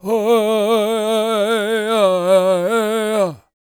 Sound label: World Voices, CHOCTAW